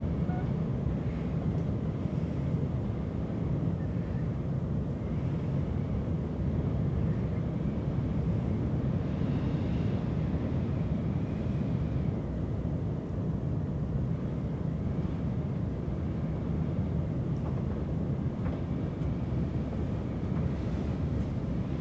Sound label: Room Tone Wind